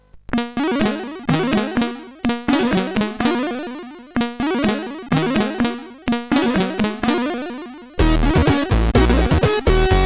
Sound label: Jingle Funky Balls, CSG8580R5